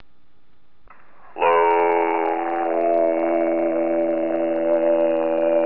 K3 Audio Cracks

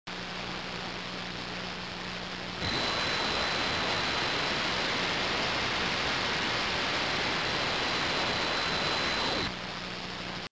Noise AM

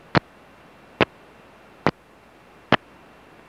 21 MHz USB 12 kHz, noise

